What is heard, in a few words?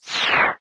Power Jump